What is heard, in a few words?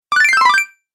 Game Collect Your Bonus, Video Game Sound Effects